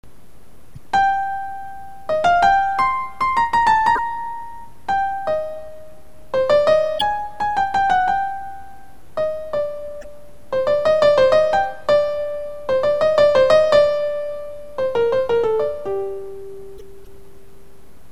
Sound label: piano